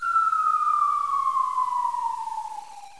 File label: fall